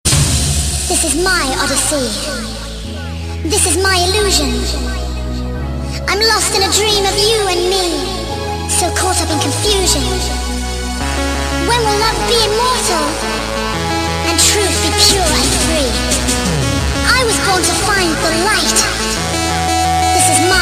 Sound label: Disco Club Mix